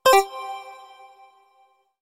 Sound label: cancel